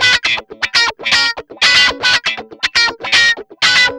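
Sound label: GUI 120 C#, guitar; Best Service Gigapack 2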